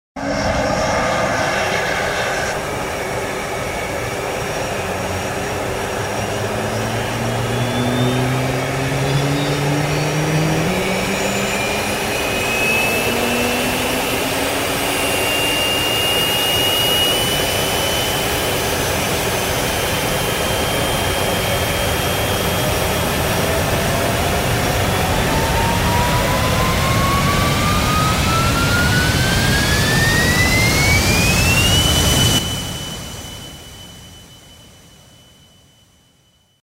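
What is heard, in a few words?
sound of a jet engine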